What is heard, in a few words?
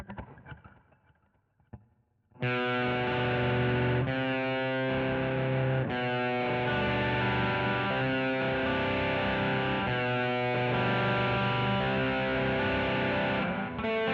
GTR B Solo